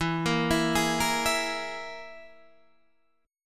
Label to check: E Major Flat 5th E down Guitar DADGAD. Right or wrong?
Right